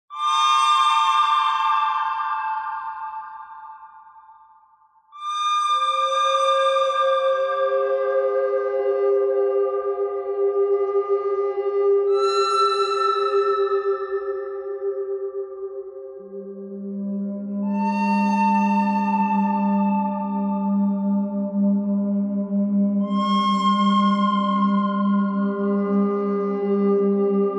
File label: bird thread pentatonic